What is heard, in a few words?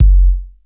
BD, Casio CTK-5000